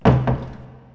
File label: heavy door; Hellboy